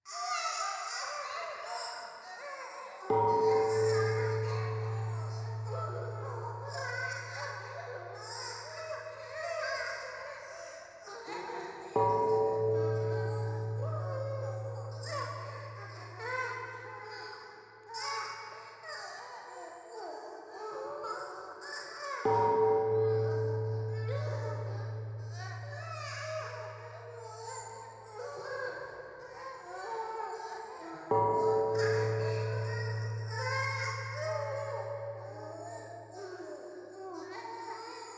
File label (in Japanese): シャブ喘ぎ 神社